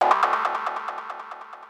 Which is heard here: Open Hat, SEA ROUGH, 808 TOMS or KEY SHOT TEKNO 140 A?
KEY SHOT TEKNO 140 A